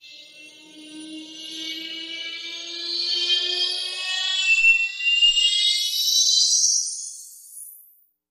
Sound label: Sharp Transition